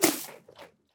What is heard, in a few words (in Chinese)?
山羊进食声